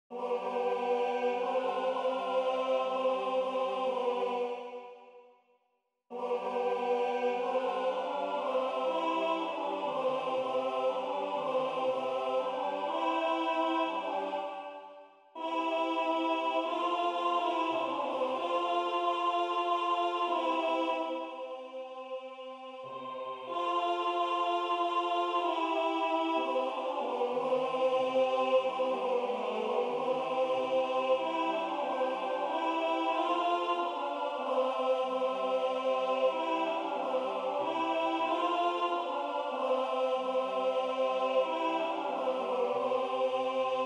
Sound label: Benedictus Tenor